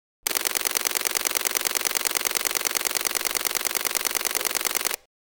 Sony a7iv Rapid shutter Mechanical clicks High speed